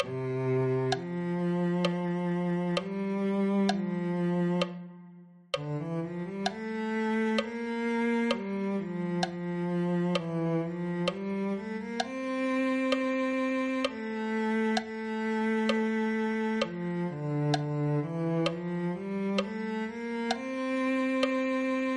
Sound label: cello